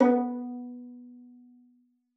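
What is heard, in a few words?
violin pizzicato B5; Xsample VOL-5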